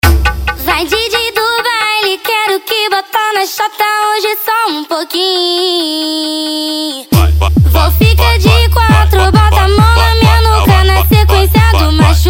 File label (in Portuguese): especial funk mega bass